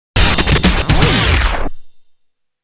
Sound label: Jingle 2 (Join); PlayStation2 version; Tekken Tag Tournament; arcade